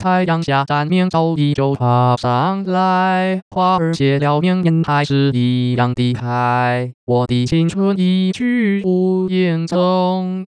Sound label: synthetic singing voice, 男聲, original; 使用頻譜包絡內插之國語歌聲合成 (Mandarin Singing Voice Synthesis Using Spectral Envelope Interpolation); Hung-Yan Gu and Zhe-Zhang Tsai